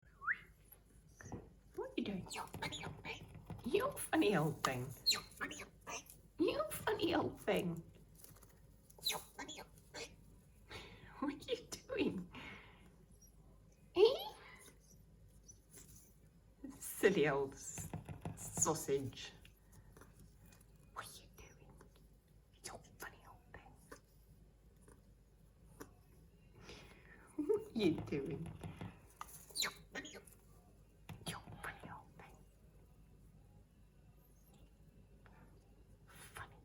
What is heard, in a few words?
blue headed pionus talking; pionus menstruus